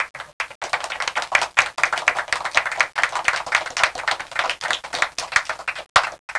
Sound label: APPLAUS